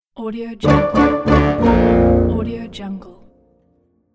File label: ta da da dam cartoon fail; phillipmariani